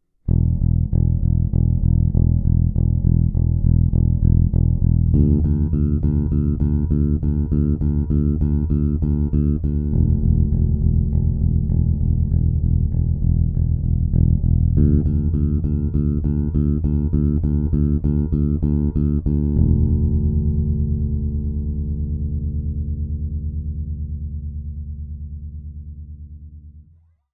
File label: No Muting Technique, Bass; 7-Day-Bass-Challenge